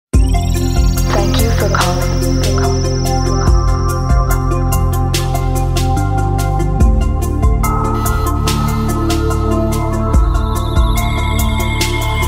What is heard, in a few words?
Motorola Ringtone Future Hi Tech